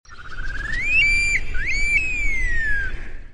Ambiance jungle; bruitage

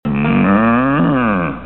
Vache; bruitage

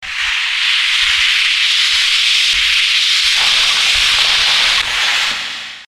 Glisse, Ski

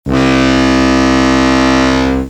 Klaxon bateau

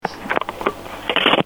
Raccrochage

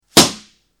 Ballon qui éclate

